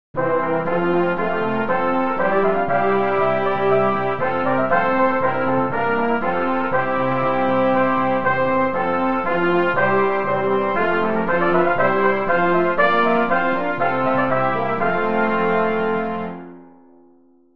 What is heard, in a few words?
Hymn